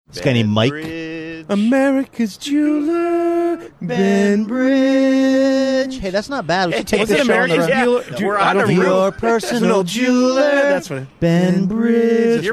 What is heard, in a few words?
taking turns singing jingle; ben bridges